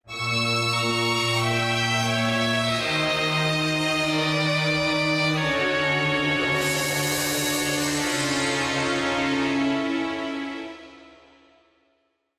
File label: gameover; Trash Panic; PS3 gamerip 2009